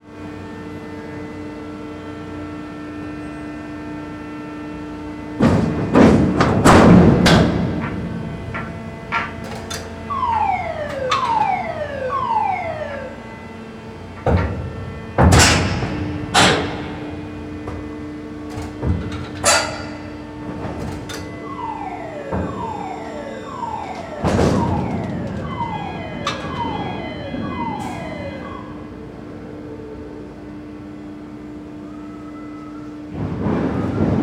Container Ship Being Loaded In The Hold Impacts With Nice Reverb, Docks; Original Sounds